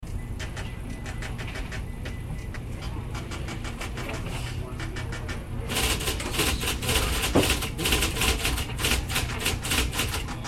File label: atmo locale office ancient newsroom